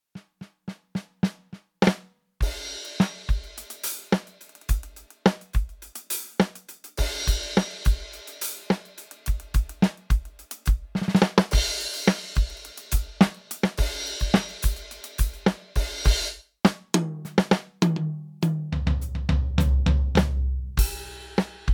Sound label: EFNOTE Mini 5 Studio Session Kit